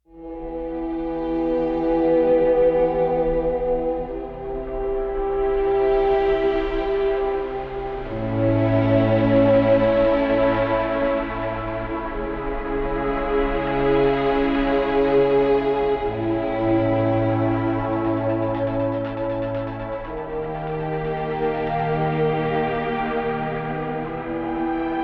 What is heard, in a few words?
Ambient